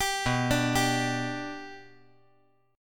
B Minor Sharp 5th B

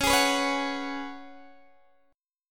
Db 7th Suspended 4th Db down Guitar DADGAD

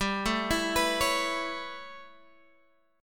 A 9th G, Guitar Standard; 15,12,14,16,14,x

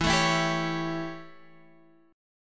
A 7th E, chord

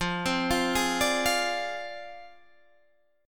G sharp 6th Flat 5th F

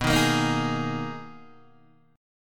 B Major 13th B down Guitar DADGAD

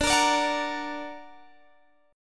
D Augmented D down Guitar Standard; D+ strummed; JGuitar

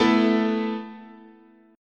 A Suspended 2nd, guitar chord

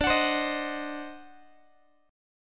D 7th Flat 9th, Guitar; x,22,21,22,19,x; 4A,4E,5A,5D,5G#,6C#; strummed; JGuitar

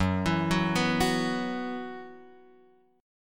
F sharp 7th Suspended 4th, Guitar, Open F; F#7sus4 arpeggiated

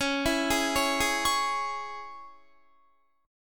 Db Minor, Guitar; arpeggiated

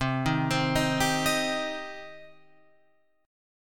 C Minor C down Guitar Open F; arpeggiated; JGuitar

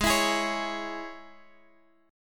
G sharp Suspended 4th Sharp 5th

